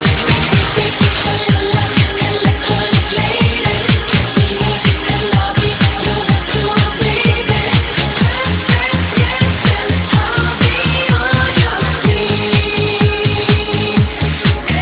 electronic lady

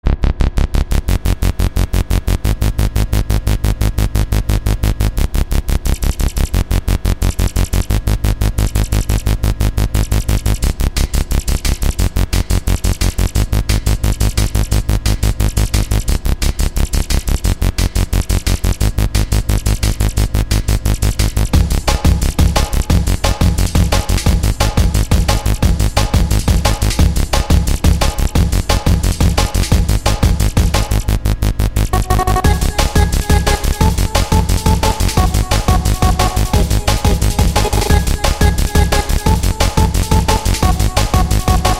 drumversion; superhit russian

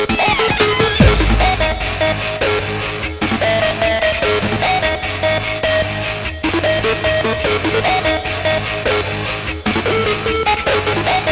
didgi

